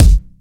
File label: shiny bass drum one shot G key; kick drum samples